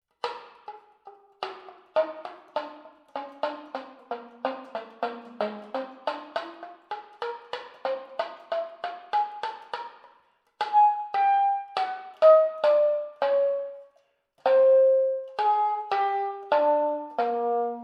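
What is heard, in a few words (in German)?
sop, Slap, NormalerSlap; Secco Slap; Open Slap. Jeweils mit Tönen und Mehrklängen in kontrastierender Dynamik. Sopran; saxophon; spieltechniken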